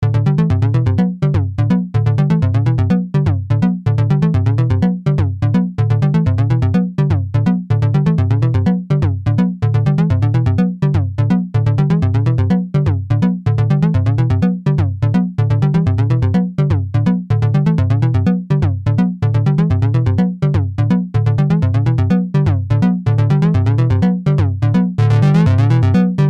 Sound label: Malekko Manther Glide